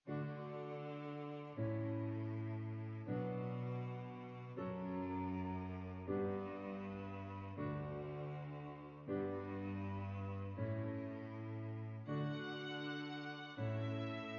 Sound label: Piano Trio; Kanon